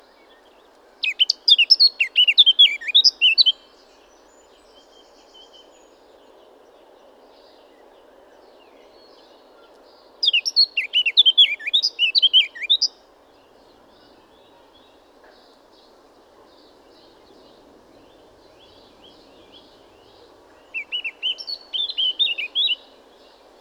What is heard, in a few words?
Eurasian Blackcap Sylvia atricapilla; chant des oiseaux des jardins